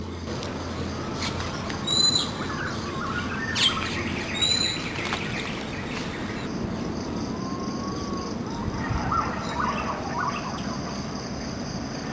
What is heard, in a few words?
Pink-necked Green Pigeon Call